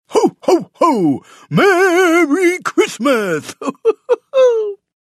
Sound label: Ho Ho Msg